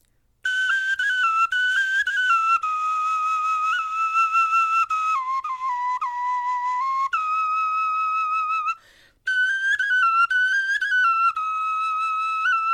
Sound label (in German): melodie